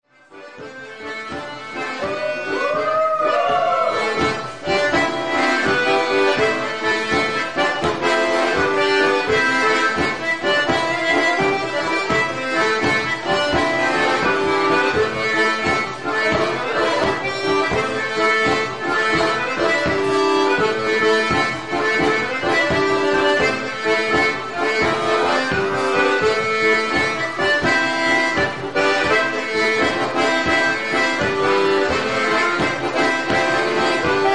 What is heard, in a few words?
Bourree trad, balfolk